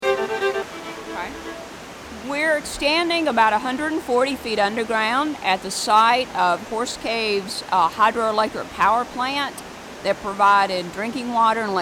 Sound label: Plays Violin